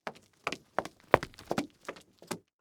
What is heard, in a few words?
Foley Footstep Hard Sole Run By; Foley Footsteps